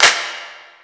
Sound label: Clap, Yamaha SY22; Drums